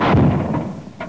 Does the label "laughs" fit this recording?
No